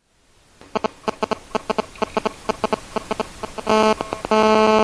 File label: interference; deathrun silenthouse